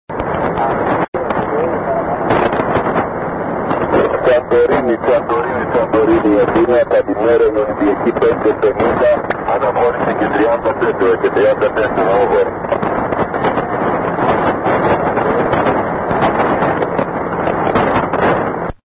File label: aero 5637 khz; santorini; athinai